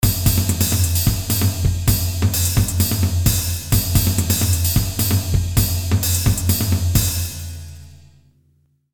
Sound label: 130 swingbeat